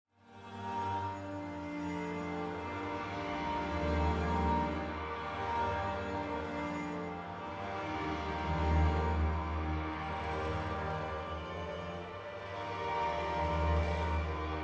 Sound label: Scratchy Old Pad; ASCEND